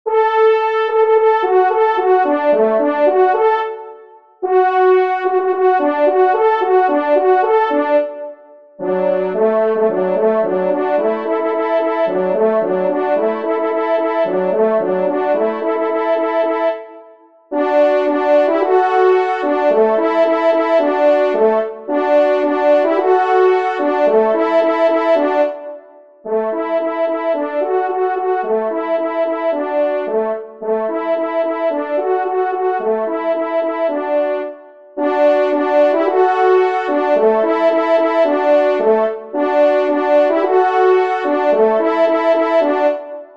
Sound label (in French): trompe; Le Momusien; Alexis Sombrun; 2e Trompe